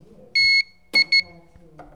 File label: Door beeping; fieldrecordings